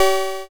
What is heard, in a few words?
cowbell